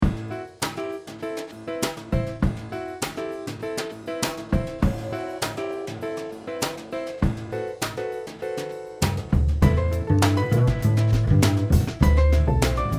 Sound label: Jazz